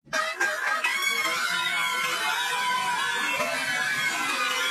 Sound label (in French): femme qui crie